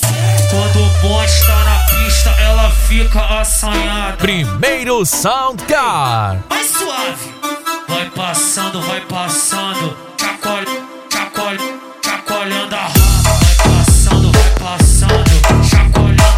sound car

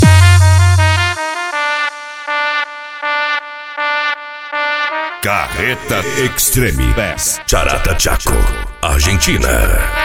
extreme bass; carreta

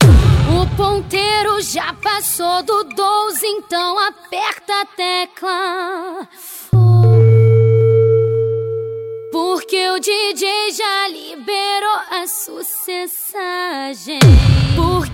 funk bass

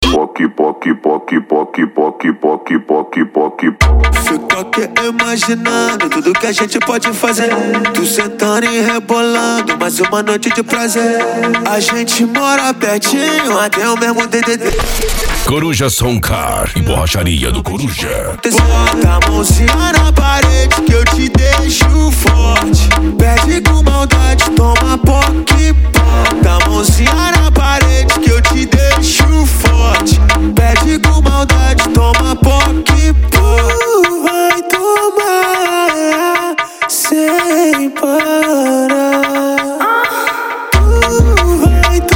funk; coruja som car e borracharia do coruja

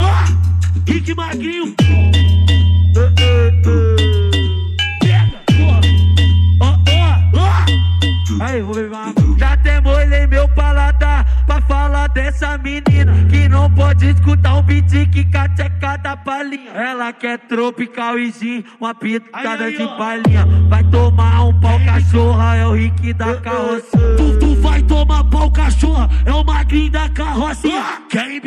funk bass

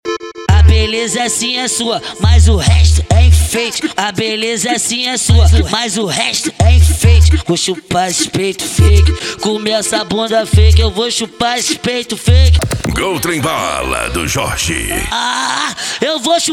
funk mega bass

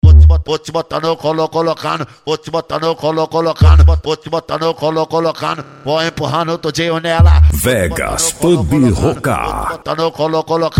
funk mega bass

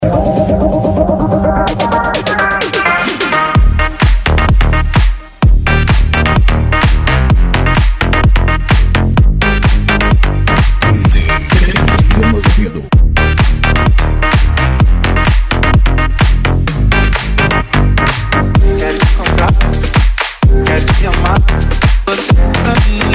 deep house and brasilian bass

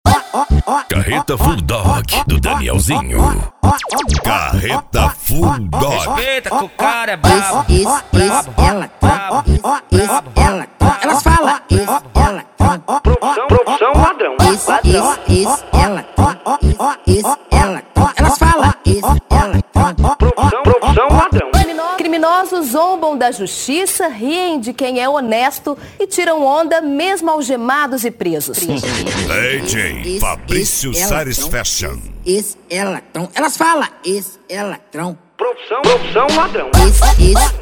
funk

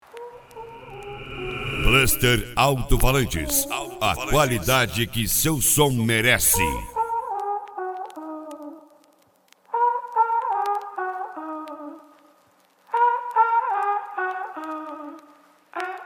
bass; bluster alto falantes

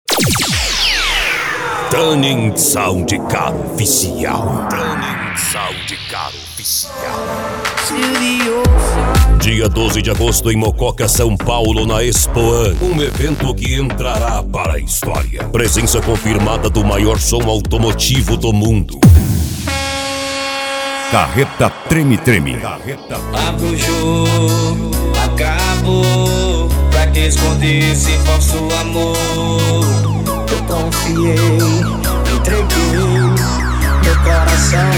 sound car

